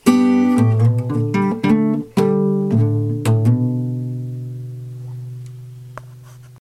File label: e shape major embellishment lick b, guitar